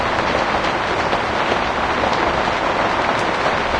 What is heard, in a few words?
interior rain med loop; ambient, ambience, rainscapes, rain